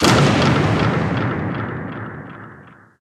SPELLS Blast; Puzzle Chronicles; PS3; PSP; X360

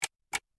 Tick Tock; My Cute Commissar

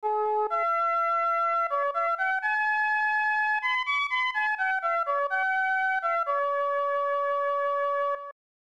Crescent Moon Flute; benkei gaiden; snes

